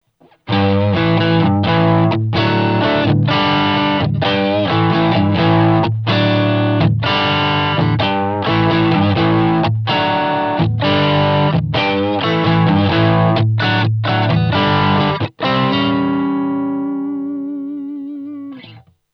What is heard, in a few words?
tele drive neck